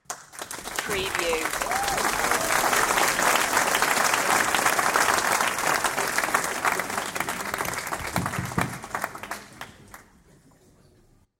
Big Crowd Audience Applause; beanstalk audio

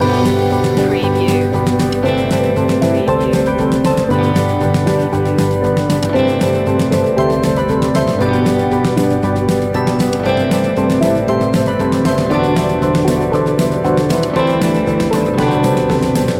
gentle rain loop

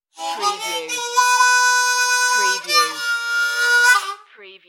Harmonica Riff

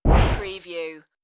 Wipe

